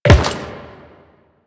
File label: Step Metal, footsteps; Warframe; Quests; The New War; Kahl